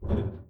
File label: barefoot metal; Apotheorasis